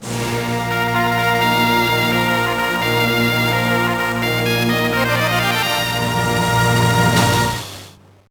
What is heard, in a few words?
Mini Game Win; finding nemo 2003 gba